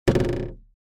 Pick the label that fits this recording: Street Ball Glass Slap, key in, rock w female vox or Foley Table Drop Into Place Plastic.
Foley Table Drop Into Place Plastic